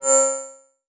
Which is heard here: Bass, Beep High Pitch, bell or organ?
Beep High Pitch